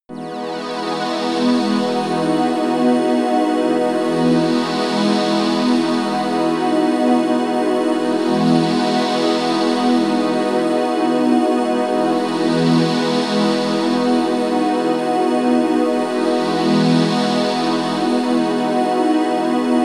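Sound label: chrystal air, pads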